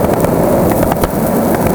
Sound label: roll sidewalk, terrains; Tony Hawk's Project 8; XBOX